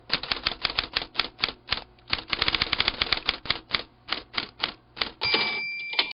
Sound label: Typwriter Keys Bell